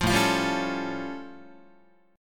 A Augmented 7th A Guitar; strummed; JGuitar

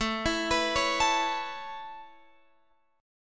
A Major Add 9th A Guitar Open F, chord; Aadd9 arpeggiated

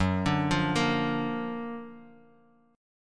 F sharp Augmented Major 7th; arpeggiated; JGuitar

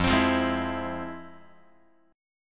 F Minor Major 7th, Guitar, chord; FmM7 strummed; JGuitar

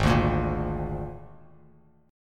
Ab Suspended 2nd Flat 5th Ab, Bass Standard; strummed; JGuitar

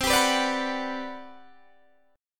G Major 7th, C, Gmaj7/C chord; strummed

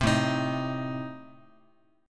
A Diminished A, Guitar; 3,5,6,x,x,x; 4A,4E,5A,5D,5G#,6C#; strummed; JGuitar

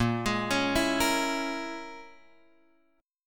Bb Minor 7th Flat 5th, Guitar, Open F; Bbm7b5 arpeggiated

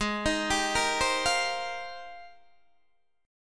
G sharp Major 13th, Guitar, DADGAD

